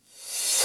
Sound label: Cymbals Reverse Crash; Vengeance Samples